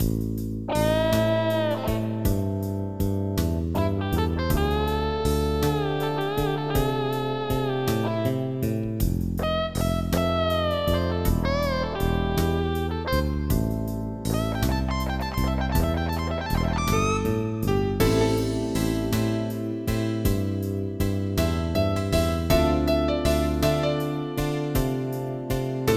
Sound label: blues